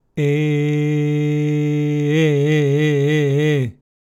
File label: vibrato C e slow